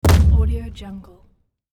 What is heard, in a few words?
door slam